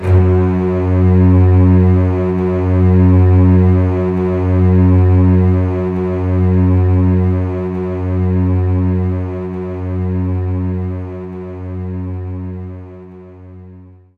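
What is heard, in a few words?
String Loop F sharp 1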